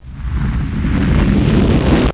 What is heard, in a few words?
EXPLODE